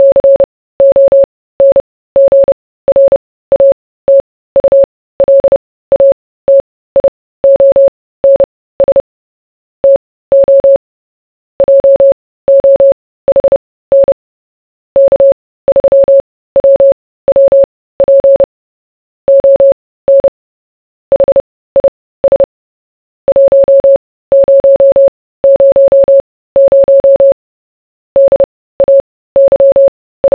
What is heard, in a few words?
morse